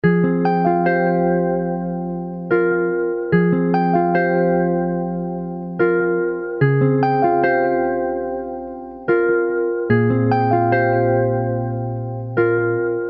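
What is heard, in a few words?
F minor 146 BPM Guitar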